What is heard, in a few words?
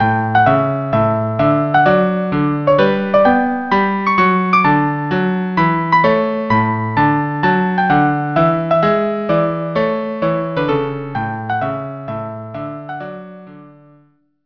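duo violin cello violin; copellia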